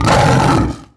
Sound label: stygian lion attack; DAoC